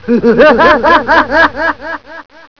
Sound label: Looney Laugh; Cool Protection